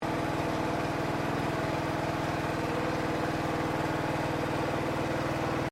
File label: ROTARY HOE OPERATION LOW LOOP, simple petrol driven farm hoe; HOWARD TERRIER 1953; Machinery; Machines